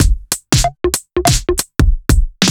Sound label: Heavy Swing 95 D, 95bpm; off the grid